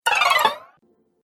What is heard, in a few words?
Cartoon Game Fast Vibrating Jump; Bounce Sound Effects